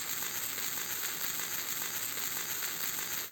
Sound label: Grill corn; anemoi